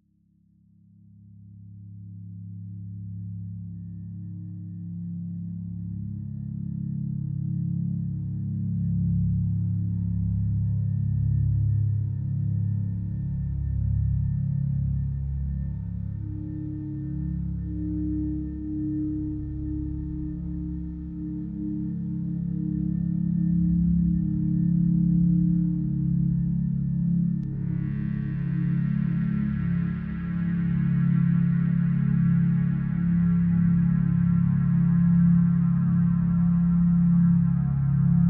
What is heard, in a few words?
Drone